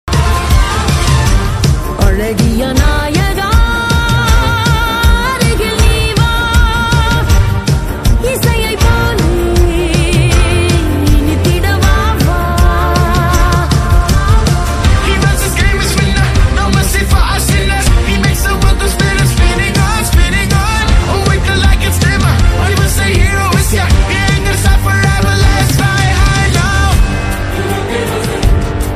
tamil ringtone

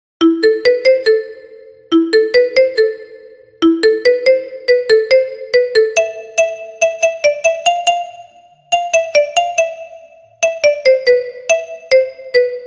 marimba ringtone; money heist; bella ciao

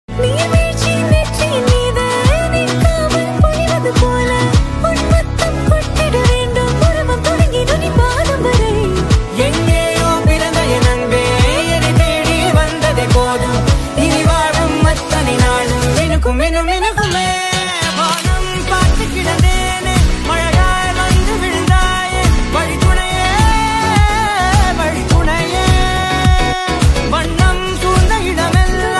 tamil love song ringtone; dragon movie

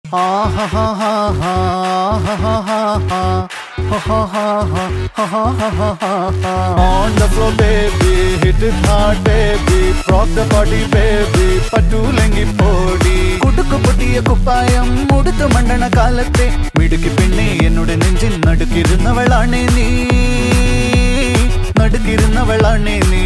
malayalam ringtone; love action drama

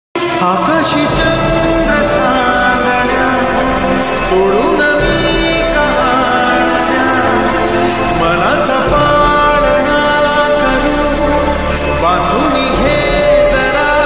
marathi lofi sound; kakan

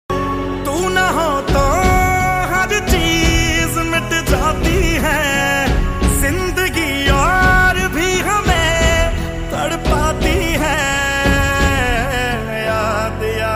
punjabi song music sad

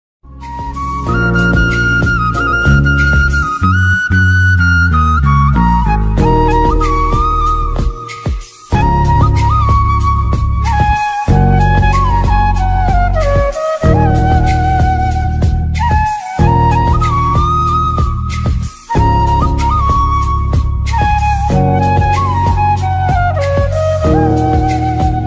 radha krishna ringtone bansuri ringtone

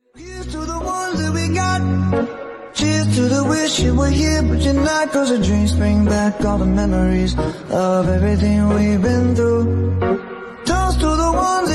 instrumental ringtone

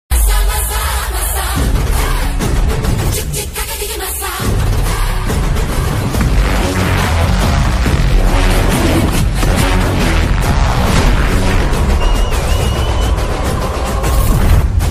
bgm ringtone; peddi; ramcharan

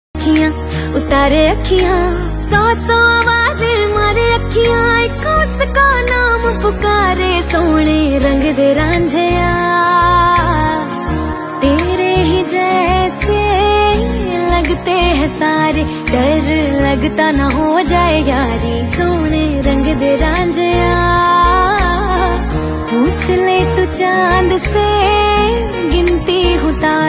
female song

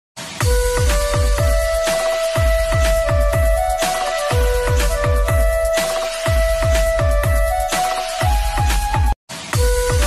most beautiful ringtone

